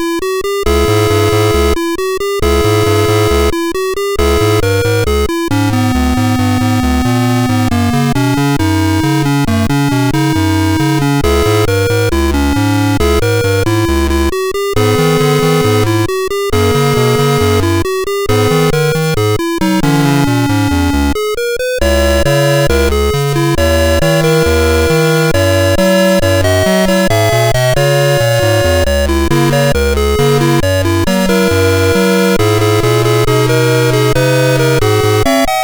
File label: Cotton Mill Blues, MOS6581R4